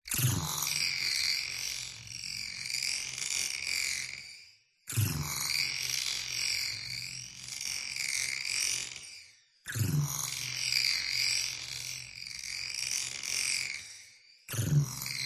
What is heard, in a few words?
robotic factory machinery futuristic mobile app pick up sci fi mechanical glitch